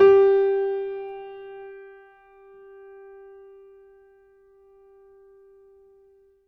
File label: MIX MONO, Acoustic Piano; InVision Interactive; Miroslav Vitous